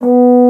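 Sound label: Bassoon B0; Digital Sound Factory Virtuoso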